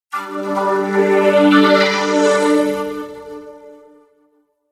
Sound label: in Swirl Pad, Vocoder